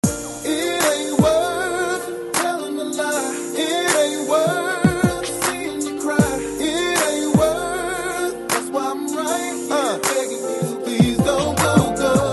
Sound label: Tank, Ringer; V710 Ringer